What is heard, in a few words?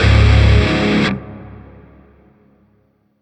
Shawzin Chromatic Chord B; Music; Warframe; Grineer; Chords